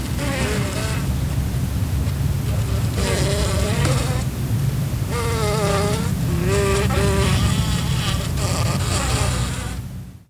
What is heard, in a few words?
house fly